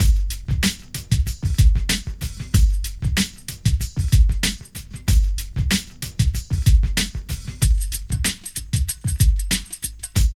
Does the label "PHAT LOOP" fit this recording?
Yes